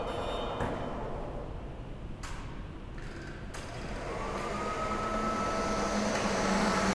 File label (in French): lessiveuse; Avec vues sur terrasses